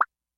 In Tutorial Arrow

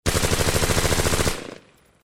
Gun Fire; Mars Sortie

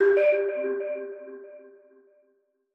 Marimba

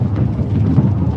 Sfx Bowling

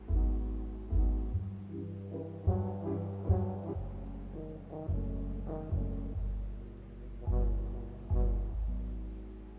Jazz Loop; Specialphoto Mondrian

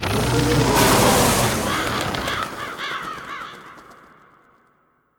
Crow; Juboku

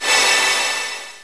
Warp

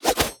Rogue Throwdagger; Pecheneg

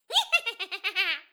Laugh

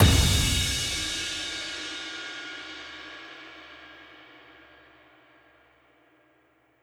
Loose Champ; Legend of Keepers

